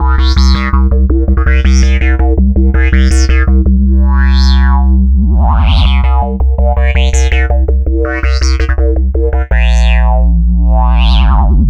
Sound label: Synth Bass